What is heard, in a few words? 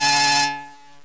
alarm; ambient